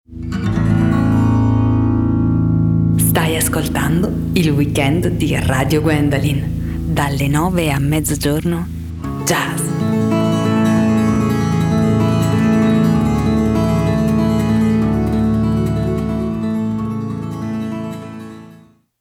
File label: Jingle Weekend Jazz